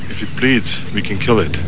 BLEED

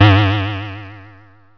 low spin; toon

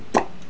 POP

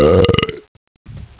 BELCH

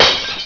crash

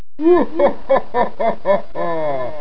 ouch

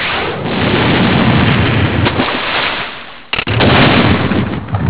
FIRE

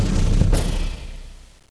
Open

